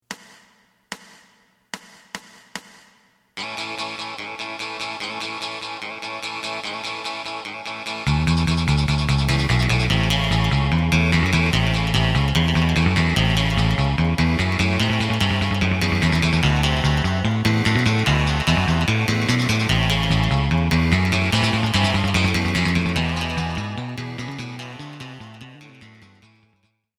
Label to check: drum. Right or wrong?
Right